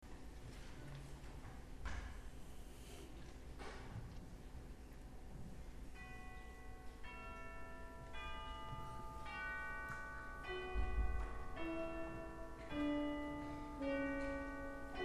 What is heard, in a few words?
Carol of Bells; Traditional